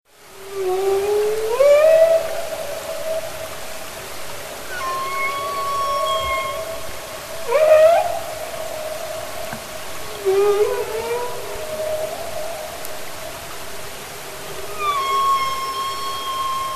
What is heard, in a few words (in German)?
Gesang Buckelwal; tierstimmen